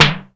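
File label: SP-12, drum machine; Best Service Gigapack 2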